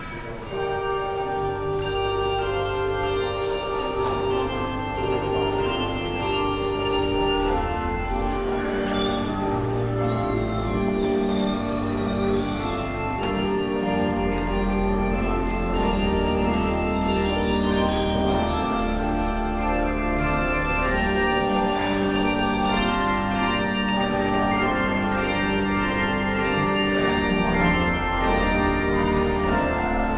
Handbells; What Wondrous Love Is This

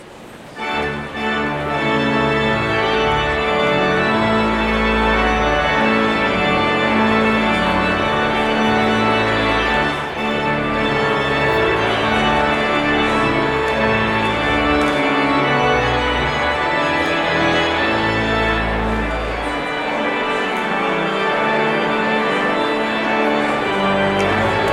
Postlude

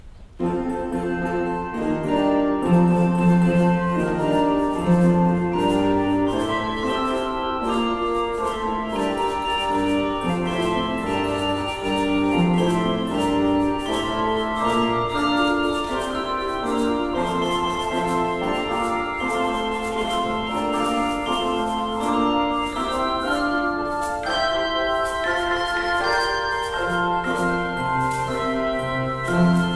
Handbells; Halle Halle Halle; Behnke

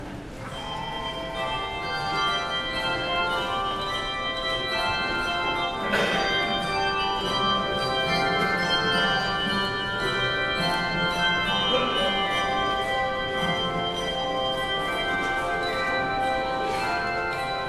Handbells; On Jordans Bank

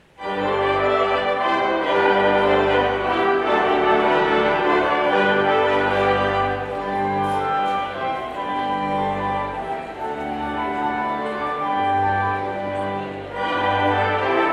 Postlude Gavotte; Praetorius

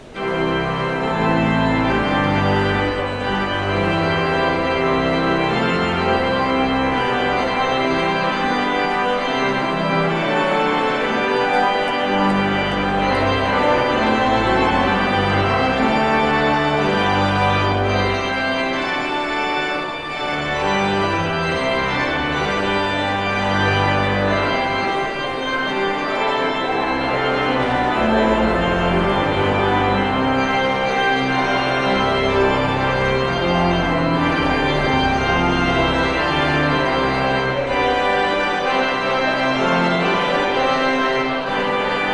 Postlude

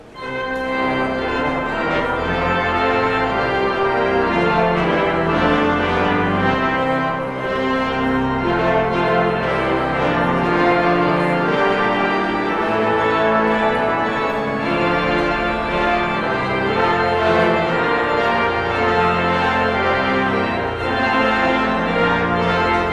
Postlude

